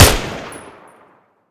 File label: Skorpion Shoot; Modern Weapons Pack